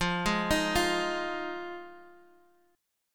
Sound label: F Minor 7th F Guitar Drop D